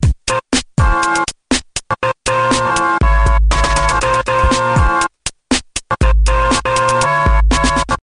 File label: techno music